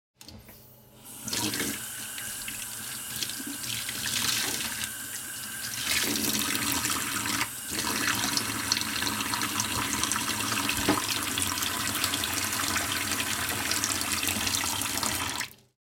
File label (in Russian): zvuk krana s protochnoj vodoj